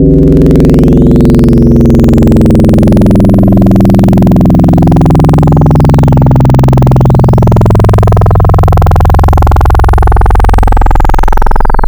Sine Sweep, wavetables; Cymatics; Wavetables Collection Vol 3; Serum

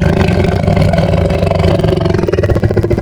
Tiger, Wavetables; Cymatics; Serum; Animals for Serum - Gold Edition

